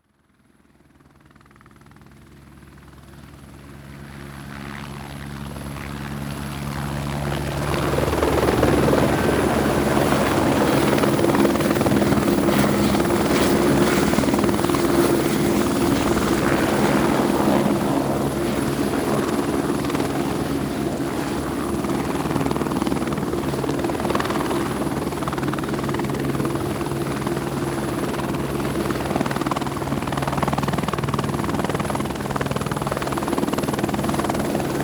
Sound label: Helicopter Approach Hover Fly Away; A helicopter comes in, hovers for a while, and flies away; Helicopter Sound Effects